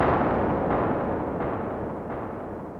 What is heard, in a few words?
FX; 075 Dmin